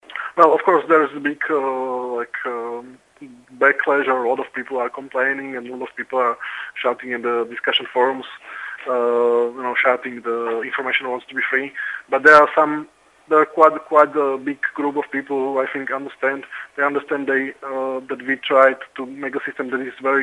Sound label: piano